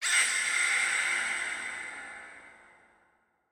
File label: activate; smite; odin